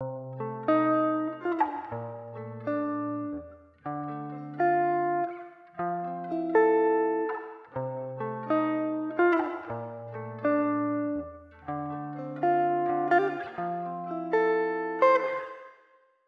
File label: Plate reverb example, guitar